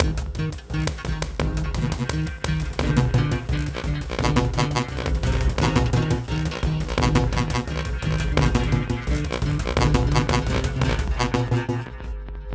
Fight Low Lowlife; Remember Me

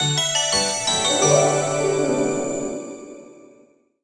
Jingle Item Get Rare; Petz Beach Countryside; nintendo ds

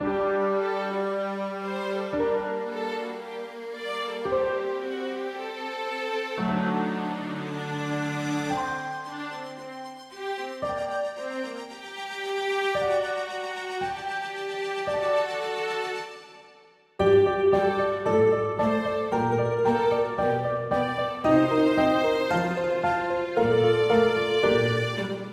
Steampunk Basement

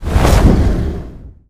Fire Explosion

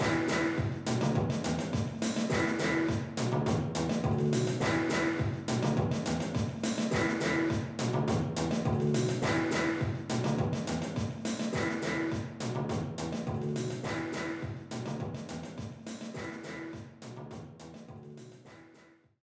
BGM Mission Stage Leak; Alterna C04

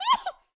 Hihi; talking tom cat 2 android ios online gamerip 2011

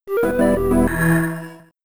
Antarctica Jingle; Ultimate Pinball Quest; Amiga

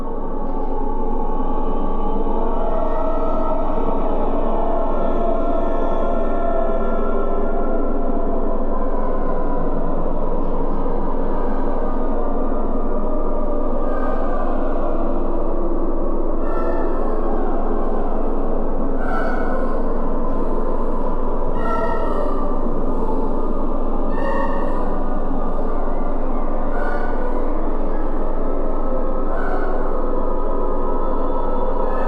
Choir; Noid; Yo! Noid 2 Enter the Void

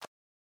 Catch; Boomerang Fu

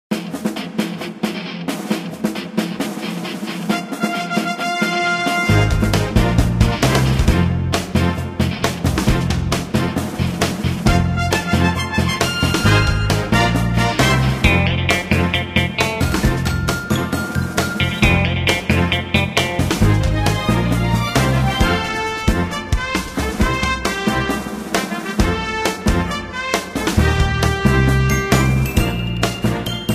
Battle Loop; guns n glory wwii android game music

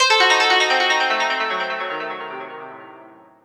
Jingle Race Lose; Zenkoku Dekotora Matsuri; wii

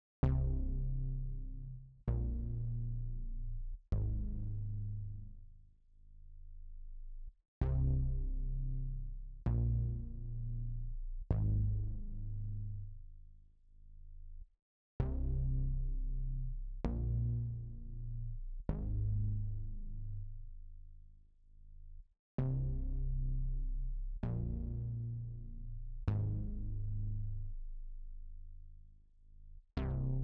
Bass

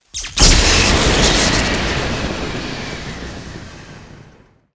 Start Water; Wario Land: Shake It!

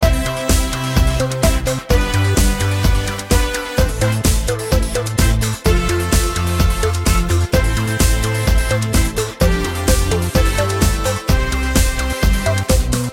Music Mission Success Screen; Calling All Mixels; 2014 mobile gamerip

